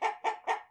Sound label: chicken buck; Minetest; VoxeLibre; mobs mc